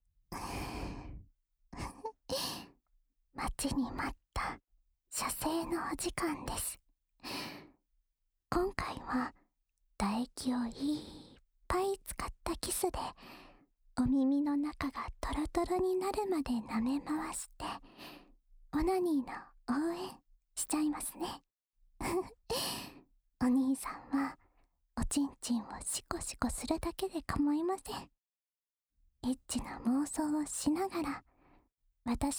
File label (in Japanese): 唾液多めの耳舐め 右耳, ASMR